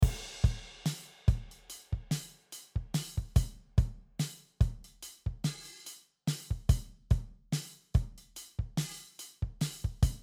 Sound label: junk drum